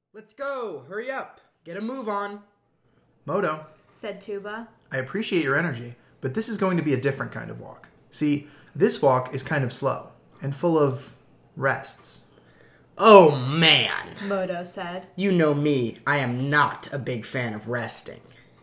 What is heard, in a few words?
tuba; tuba-turtle; preschool rhythmic tales; tuba turtle rhythm walk preschool